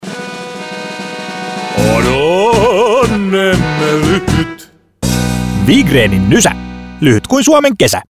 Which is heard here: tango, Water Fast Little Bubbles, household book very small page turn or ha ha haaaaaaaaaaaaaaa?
tango